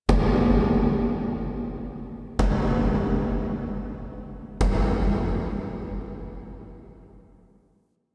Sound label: Misc Knocks Large; Ambience